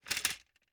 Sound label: Gumball machine, metal, glass, candy, switch, mechanism, rotate, dropping, jumbled; Eiravaein Works; Vaeyan IV